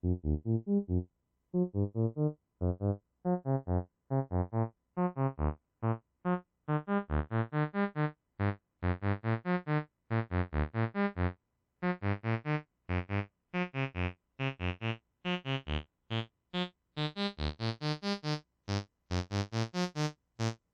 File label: D Min Pentatonic Lead Dry; Loki